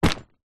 household plastic pillow or duvet bag set down on carpet